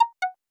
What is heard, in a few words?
Confirm